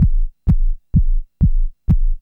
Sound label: HEARTBEAT, SYNTH FX; AMG; Global Trance Mission VOL-2